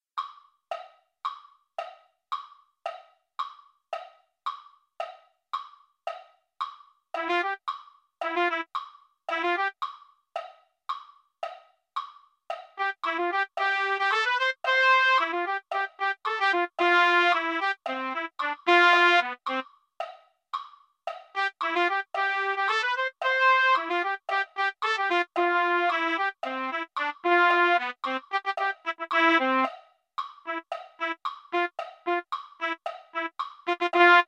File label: trumpet; holiday brass